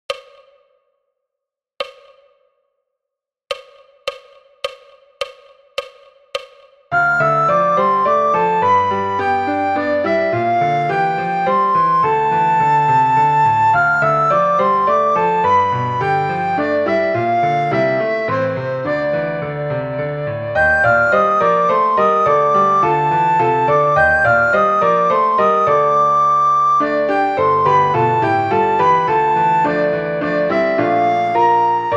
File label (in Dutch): sopraan; Flierefluiter 6; Undertale